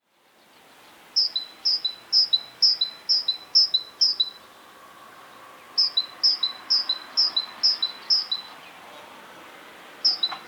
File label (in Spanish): Carbonero común Parus major